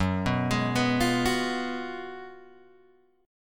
F sharp Major 7th Suspended 2nd Suspended 4th, Guitar, Open G